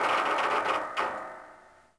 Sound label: creak metal; Star Wars Obi Wan; XBOX